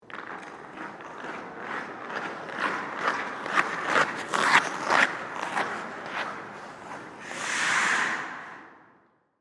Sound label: Ice Skates By, Pass, Fast, Stop, Indoor, Ice, Rink; Sports; Ice Skating